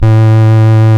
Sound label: VOX A1, Vintage Keyboards; Big Fish Audio; First Call Vintage Keyboards